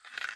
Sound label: Bite